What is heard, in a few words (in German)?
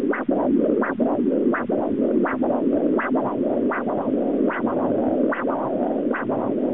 Doppler Arterie